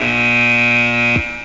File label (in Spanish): sirenazo; SpanishScene3; fermix